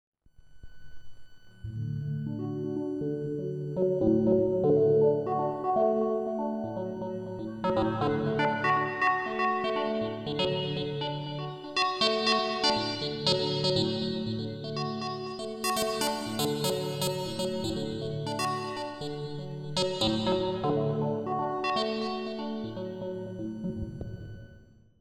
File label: Preenfm3 FM Synth bandpass Reverb